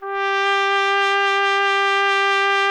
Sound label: BRS TP G 0, Trumpet; Miroslav Vitous